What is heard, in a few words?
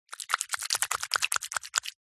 human hands wet rub together; zapsplat